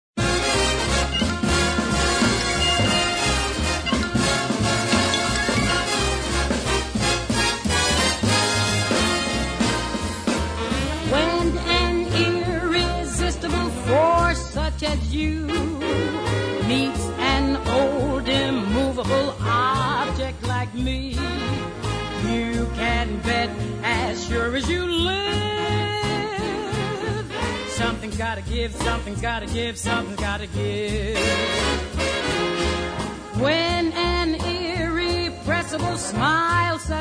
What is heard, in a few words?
swing example